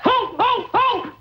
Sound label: ho ho ho; xmas